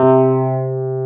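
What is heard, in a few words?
Wurlitzer C3 - R; USB Soundscan vol.09; AKAI; Keyboards Old School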